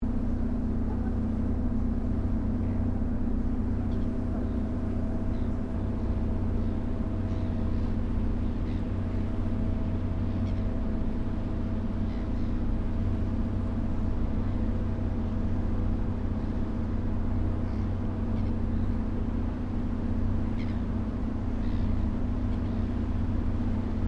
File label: Boat Water Taxi Large Williamstown Very Slow Loop, Large river water taxi; Boats (Sound Effects:Vehicles)